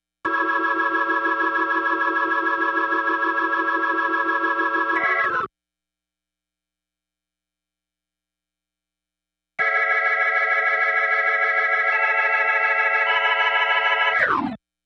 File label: Organ